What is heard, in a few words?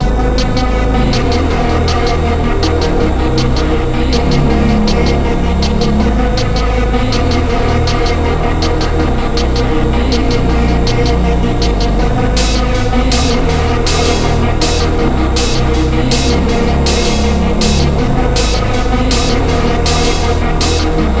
ultra slowed reverb